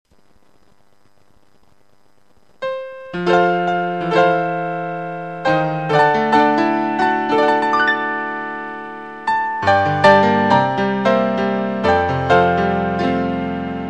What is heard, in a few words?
Christmas Piano O Tannenbaum